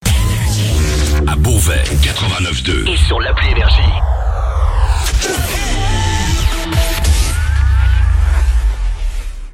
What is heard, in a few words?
JINGLE